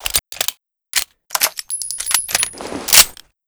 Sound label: clipout